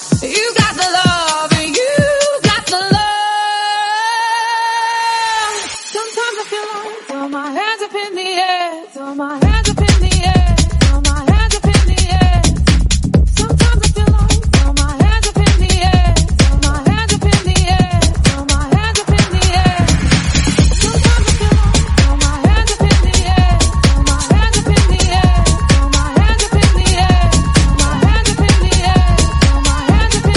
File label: Tech House 129bpm Dbm